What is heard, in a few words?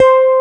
E BASS C4, Bass Guitar, STACK BASS; AKAI S6000 CD-ROM - Volume 1